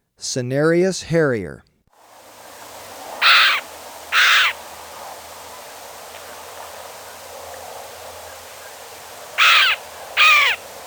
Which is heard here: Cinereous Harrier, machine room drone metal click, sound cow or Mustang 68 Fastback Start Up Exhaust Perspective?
Cinereous Harrier